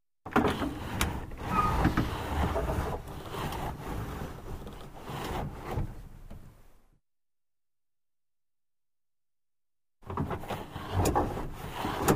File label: Car glass lowered, raised manually